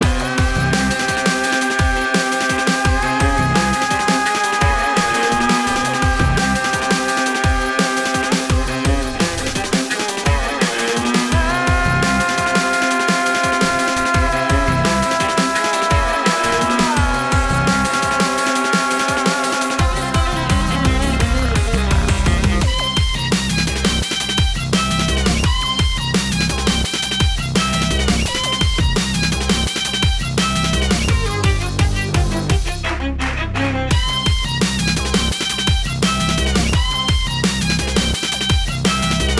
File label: desert slavic shortloop; Adventure Xpress